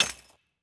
mine stone

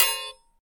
shield metal, attack impact

